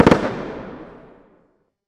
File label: completion celebration